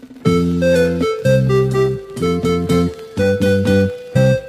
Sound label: Keyboard music fun